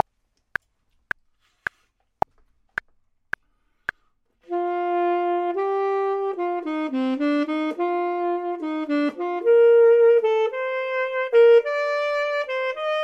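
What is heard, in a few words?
top, Saxophone and Clarinet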